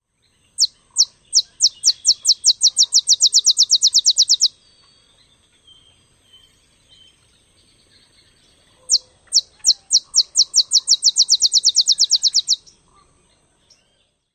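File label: song type, olive sparrow; TX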